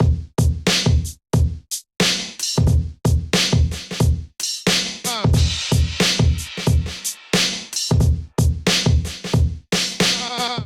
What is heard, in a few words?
Kit Groove drums only 90 BPM; MIDI Clips; Ableton; Factory Packs; Chop and Swing